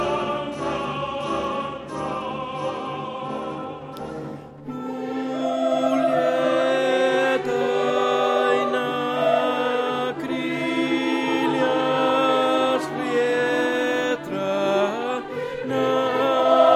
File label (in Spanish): ALTOS; REPERTORIO ÓPERA; Danza IGor